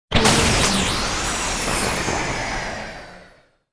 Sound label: industrial glitch damage; futuristic sounds; آسیب به سفینه فضایی